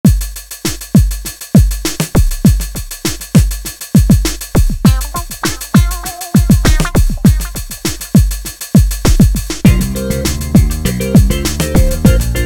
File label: Organ Funk